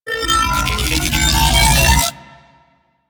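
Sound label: Drone Projectile Charge; Warframe; Corpus; Corpus Lich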